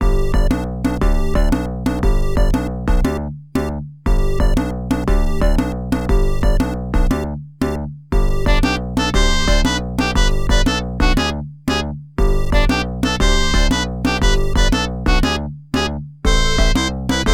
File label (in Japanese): 胃袋のファンファーレ; Bunny Hunter Zero